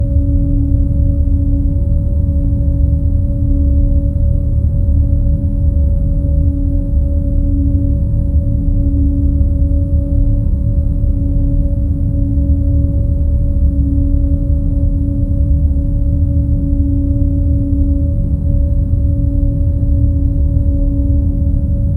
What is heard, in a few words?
underground ventilation t, tunnel